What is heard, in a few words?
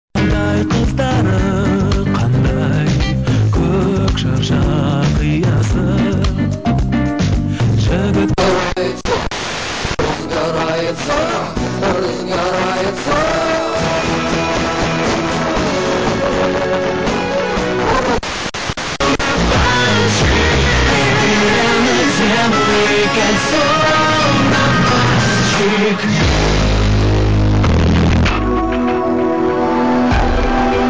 85 110 MHz